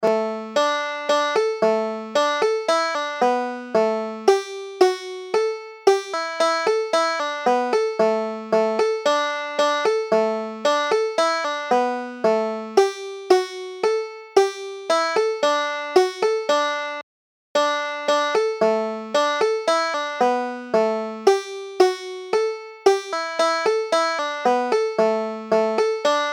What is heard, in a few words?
ricketts hornpipe, banjo